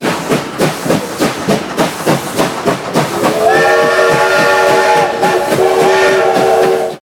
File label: Train